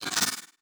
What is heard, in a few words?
Title Transition Chopper; Production Element Title Transition